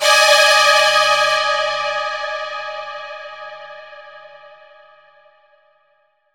Dischord, Strings & Pads; Zero-G Sound Sense Grime Scene; 90 s Sample CDs

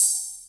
JD800 Internal Drums, Roland JD800

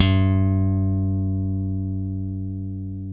FUNK, Bass; Spectrasonics; Bass Legends

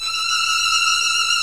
VIO MIN TRIL

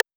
808 Rim, Roland MV-8008; Roland MV8800

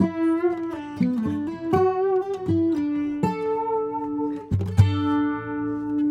Sarangi, Acoustic String

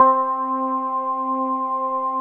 CHAUFFEUR C3; Drums In Your FACE!

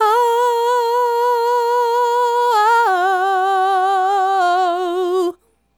Female Solo, Hip-Hop and RnB Vocals

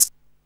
Maracas, Alesis Hr16, Drums

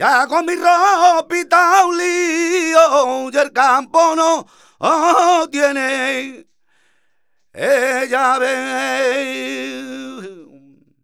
voc c, Flamenco Vocals Male Cantaor; Best Service ProSamples vol.46

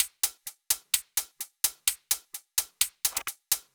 Loops 128BPM, Drumloops

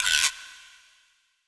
Guiro L, percussion reverb; MARKYDRUMS

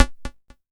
bleep, Korg Electribe ER-1

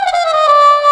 Trumpet Doik Loop; Mo' Phatt; Digital Sound Factory Mo' Phatt

